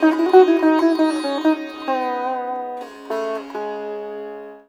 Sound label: SITAR; Spectrasonics; Heart of Asia